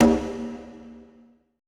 BONGO 2 R; BPC DRUMS

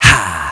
"haa"; jazz jackrabbit 2; M8

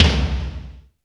TOM LO; Back In Time Records; Big Bang

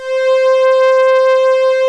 MIX STR C5

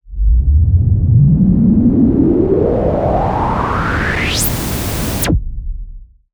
Swept Noise

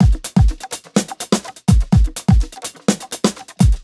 Beats, Nu Jazz House, 125; PowerFX

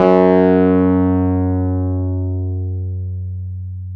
PNO, Wurly

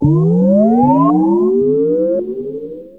ELECTRO; E-MU Formula 4000 Series Vol. 2; Effectors

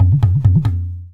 TABLA GAE2; AKAI S6000 CD-ROM - Volume 5

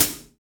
Pop Pedal HiHat

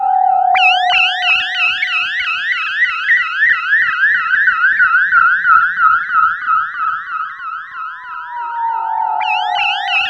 VCS WIND; Zero-G Ambient 1

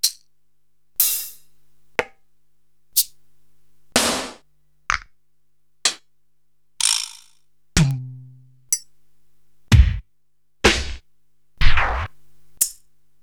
Korg TR-Rack, Samples Sliced; m8

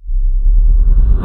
REV, SOUND EFFECT REVERSE

